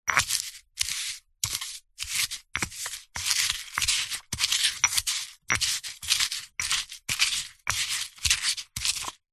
food herbs leaves grinding in stone pestle and mortar; zapsplat